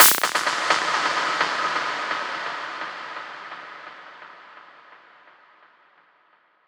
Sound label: ump; Zaps and Beeps